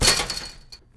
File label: metal chainlink, footsteps